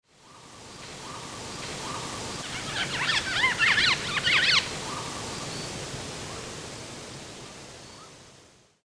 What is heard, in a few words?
indochinese cuckooshrike